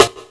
juicy snare g key, snare drum sound tuned to the G note. Loudest frequency: 2412Hz; snare drum samples; drum single hits one shots